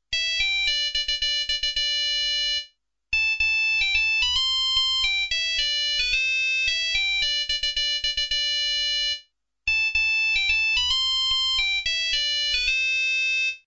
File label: whiskey in the jar ringtone